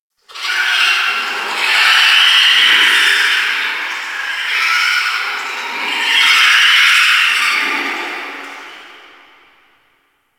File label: Ice Critter Distant A, Ambience; Warframe; Corpus Ice Planet